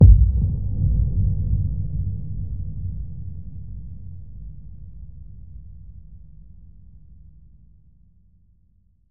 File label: Boom; Zero-G - Future Wave; Drums; Xtra